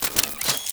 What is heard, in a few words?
Alt Mech, pistol; Crp BE Plinx; Blastor Pistol